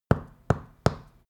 stone knock wall

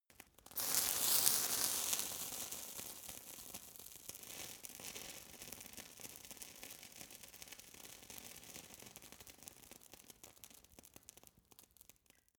fire torch sizzle long

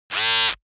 servo small buzz short

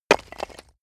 brick drop short

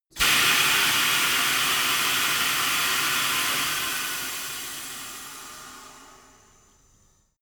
tire changing machine hydraulic hard in

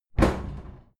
cupboard kitchen close hard

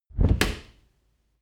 dishwasher door close

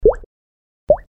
water drop

